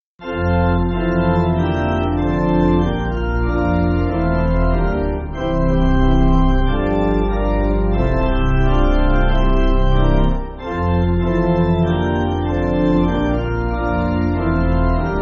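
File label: Pipe Organ, Backing Files for Churches; We Go With Your Blessing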